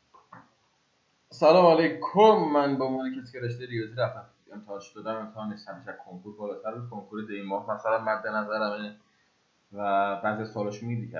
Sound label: hmmm